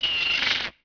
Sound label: seagull; Ambient; MX vs ATV Unleashed